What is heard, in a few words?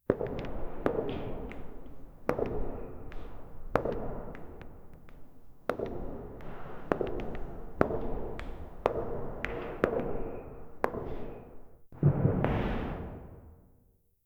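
grand persian palace ambience; architecture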